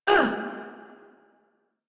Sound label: Pain; Priest Quest; Palladino